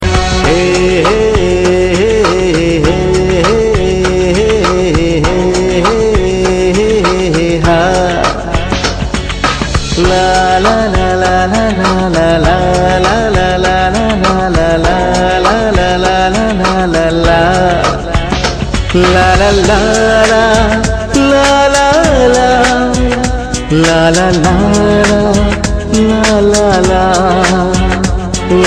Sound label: Nice Tones